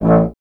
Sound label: tuba; Miroslav Vitous - Solo Instruments VOL-2